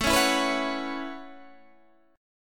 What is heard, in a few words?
B 7th Suspended 2nd Suspended 4th, chord